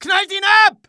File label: hit, pain; dialogue; mohaa